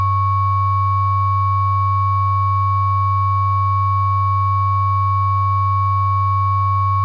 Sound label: Ring Mod; CGS; Straight